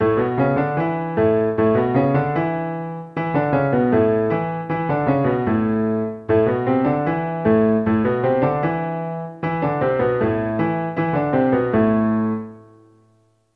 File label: 16th note in A and Am